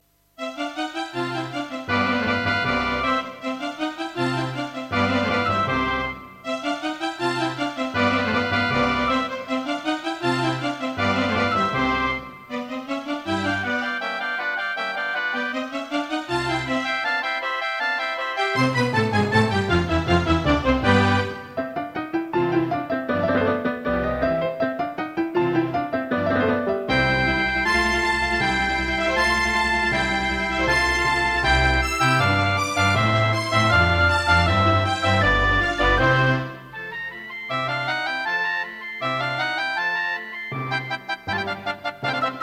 Mozart Concerto K467 partial Serdaco Dreamblaster X1